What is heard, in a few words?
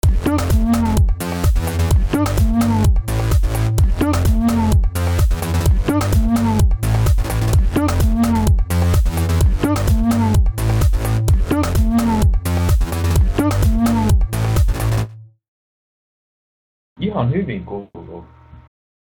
electro house party mix